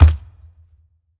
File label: step; alien